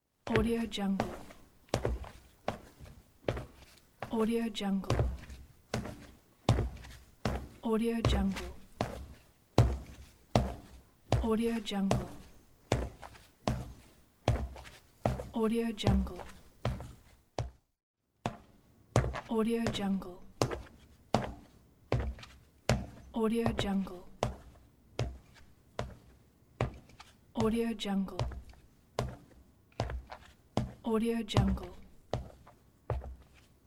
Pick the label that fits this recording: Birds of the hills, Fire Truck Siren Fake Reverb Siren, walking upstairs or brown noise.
walking upstairs